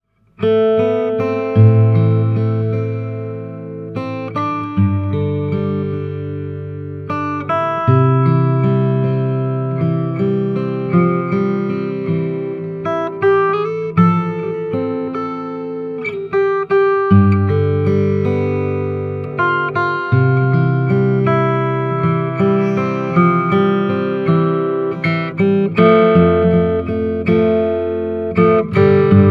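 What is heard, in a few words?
k yairi fy-200x, guitar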